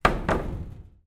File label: impact wood plank on metal wall